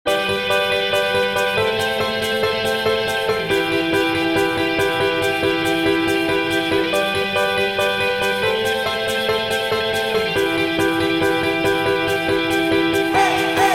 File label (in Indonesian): musik